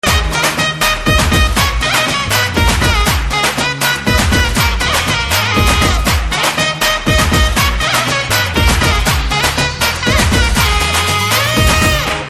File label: Drum Beats Ringtone